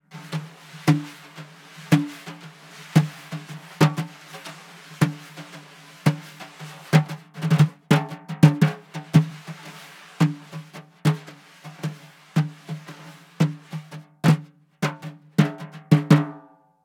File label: Snare One Mic; Manufacturer Audio